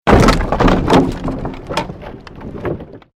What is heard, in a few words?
created wooden structure crash medium